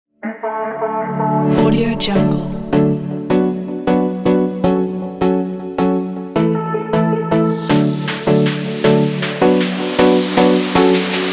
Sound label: commercial future bass